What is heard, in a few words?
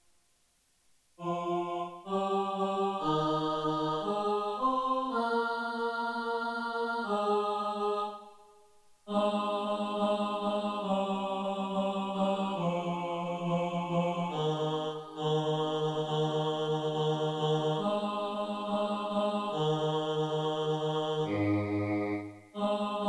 bass, choir